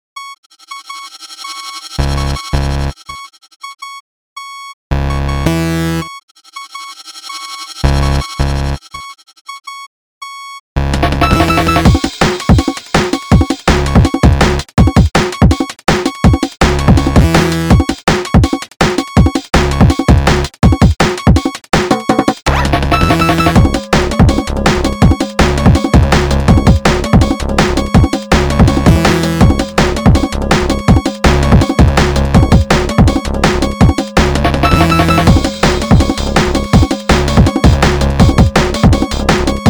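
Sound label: jungle, ringtone; Nokia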